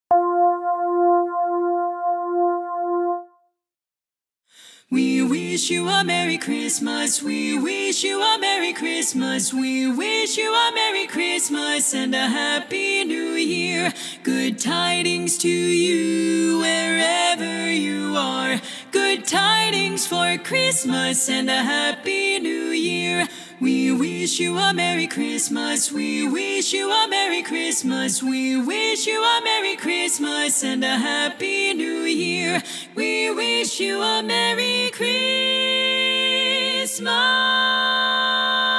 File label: We Wish You a Merry Christmas (SSAA)